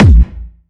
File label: Harder, kicks